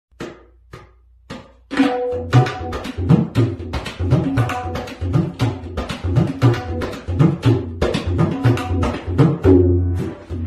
Tabla

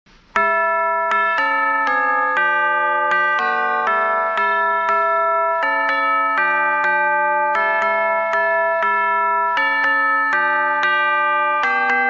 Bells, bells instrument

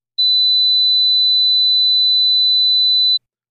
hearing test tones

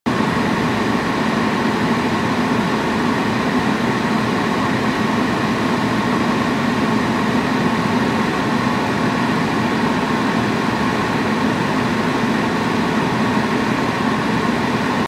Heater

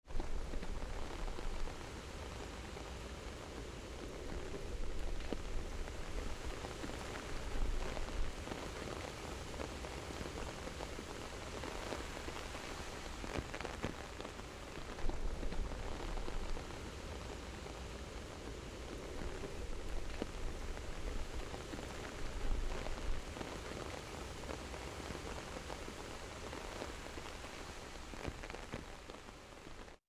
umbrella

